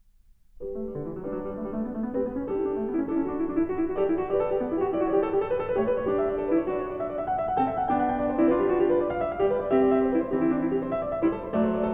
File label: Variation; Bach; Goldberg Variations, BWV 988